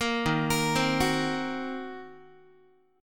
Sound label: D sharp Minor 6th D sharp down Guitar; arpeggiated; JGuitar